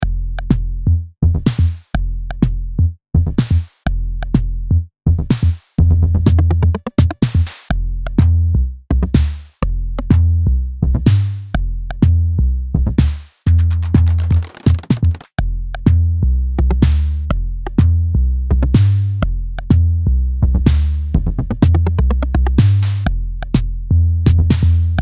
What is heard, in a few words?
HH Tune Modulation